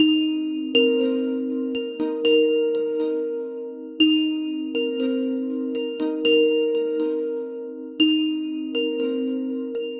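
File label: clean iconic ringtone, melodic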